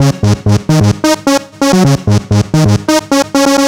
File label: NRG Lead, trance; Leads; Sonic Foundry (Sony Creative Software); Trance NRG VOL-1